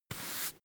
broom hand sweep

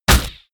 crossbow shot

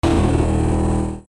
energy and rays

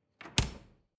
refrigerator door close hard

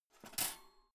toaster soft eject

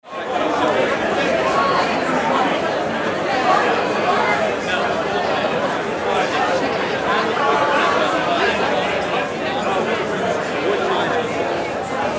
hotel bar crowded singapore

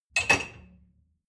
dishwasher cup grab

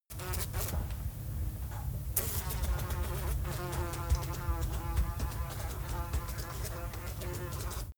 fly window

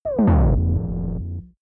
transmission digital drop

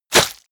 hit blood light

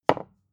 bottle put down hard

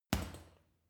boxing punching bag uppercut medium

